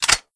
mag out dry, colt 727

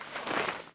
reload start, weapons

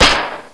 garg claw

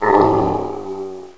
pain, zombie

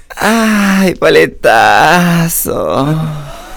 ayy

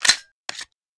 glock reload no shot

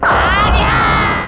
spirit bomb shoot

